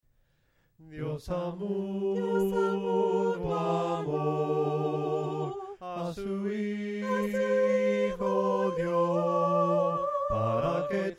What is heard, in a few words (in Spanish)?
DIOS AL MUNDO AMO, himnos a capella; cantos del camino